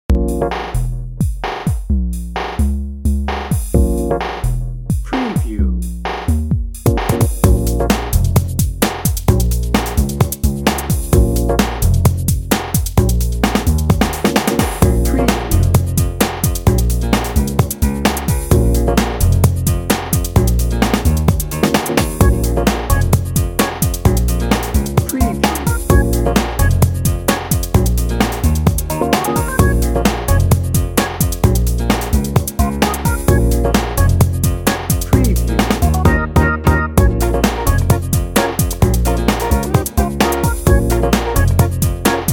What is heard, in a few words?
party set bed